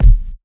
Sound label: kick sound d key, kick drum single shot tuned to the D note. Loudest frequency: 92Hz